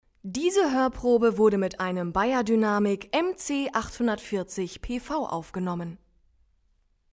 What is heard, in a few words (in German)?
klangbeispiel beyerdynamic mc 840